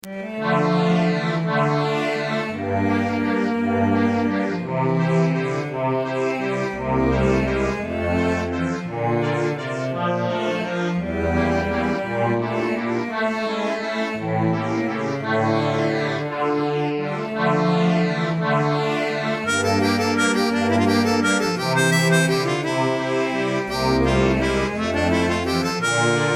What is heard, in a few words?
waltz